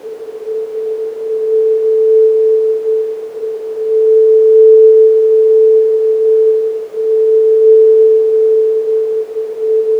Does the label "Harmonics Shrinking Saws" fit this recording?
No